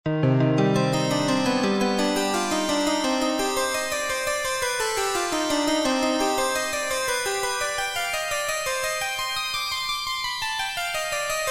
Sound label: computer solfeggio